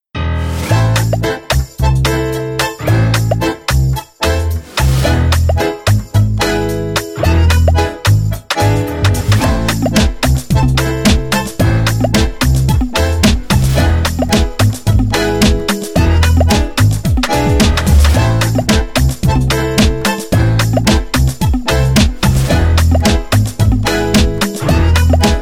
tango

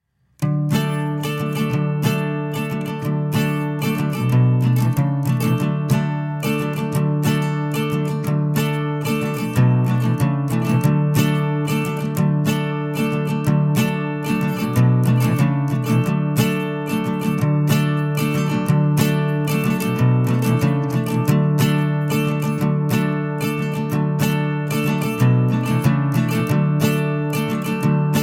guitar

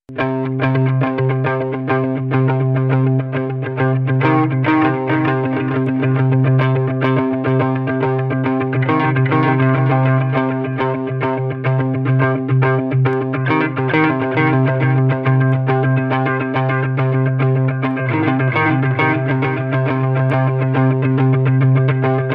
guitar

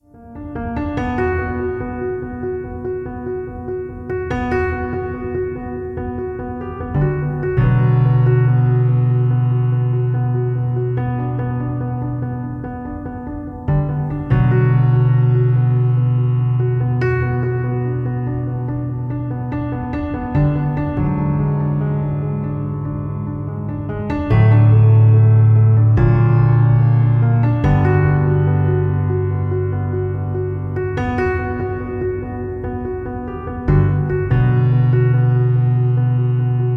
rhythm chords

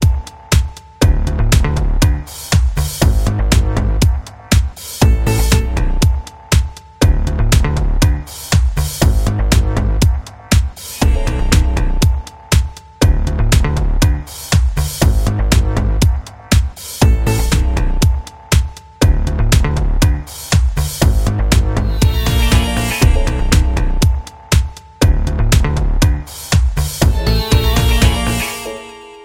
80s bass drum

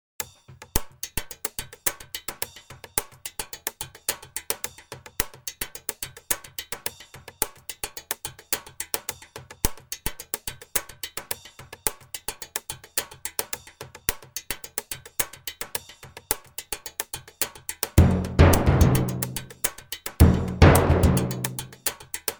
inside danger drums

